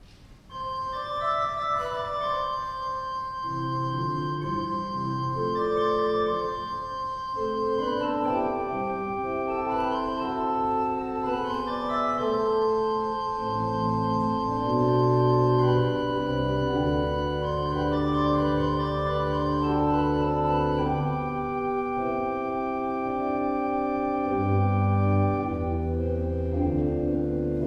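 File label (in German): Kemper Mayer, pfeifenorgeln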